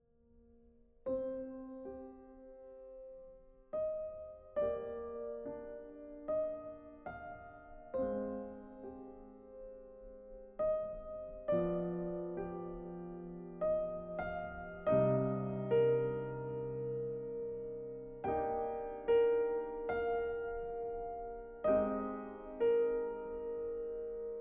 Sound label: piano bgm; ff15